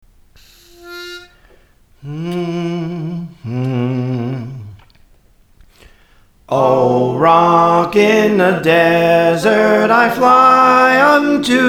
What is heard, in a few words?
Soprano, Praise For The Lord Training